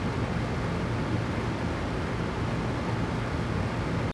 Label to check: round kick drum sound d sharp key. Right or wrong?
Wrong